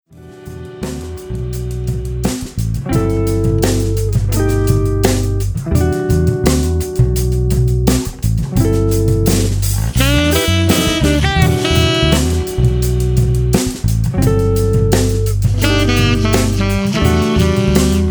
jazz band

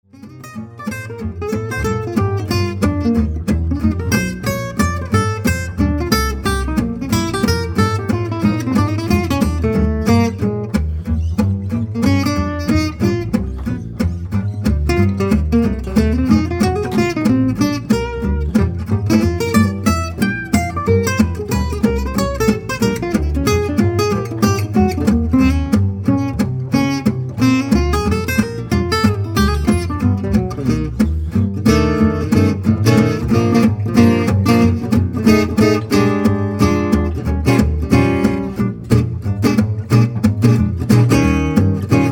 Gypsy Swing

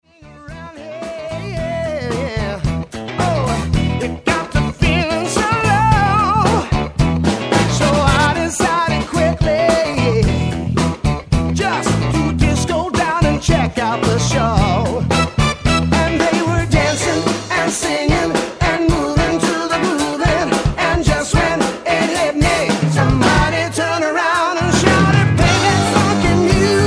funky music

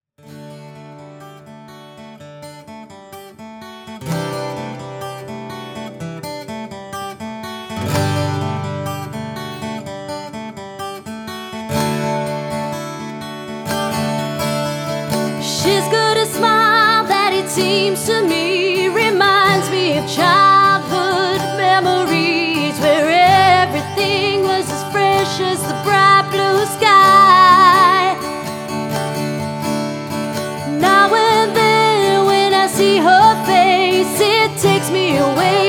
acoustic

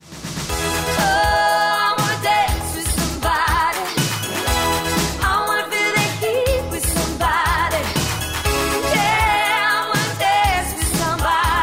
Corporate Party Cover Band

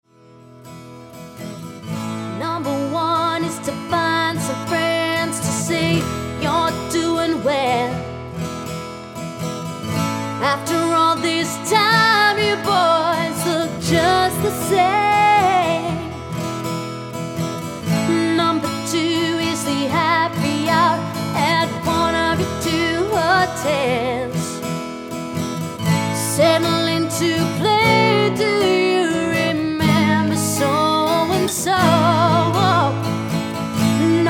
acoustic

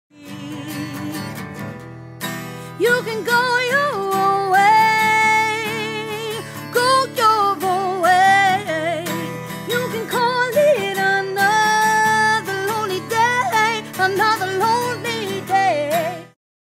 Acoustic Duo Cover

